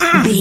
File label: voice male hurt pain